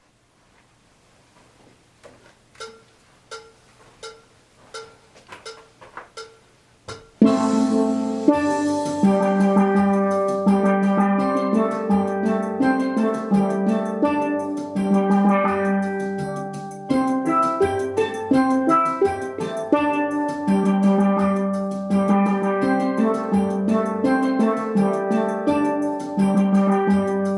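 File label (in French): Alto Intro Couplet Refrain, Steel Band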